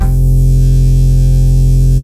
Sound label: Dance Mega Synth; Best Service; 90 s Sample CDs